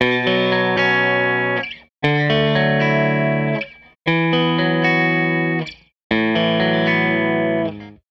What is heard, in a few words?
Tele Guitar Loop